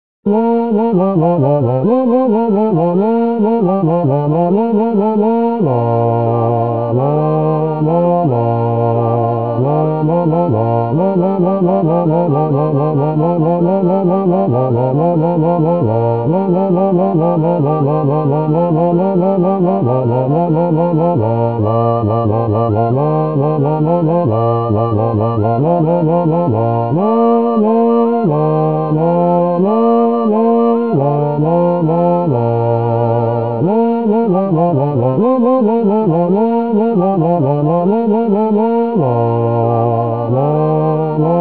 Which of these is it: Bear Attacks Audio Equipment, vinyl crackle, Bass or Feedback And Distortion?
Bass